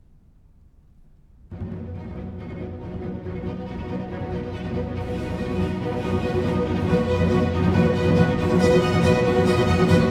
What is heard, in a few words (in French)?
Bruit de guerre pour entr'acte; suite d'orchestre; 1744; Jean-Philippe Rameau; Dardanus